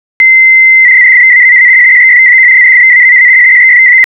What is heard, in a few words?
bnetz call